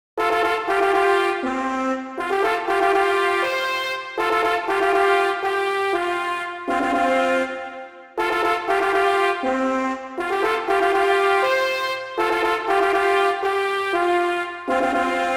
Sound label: School Brass